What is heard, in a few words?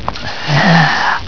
die; gman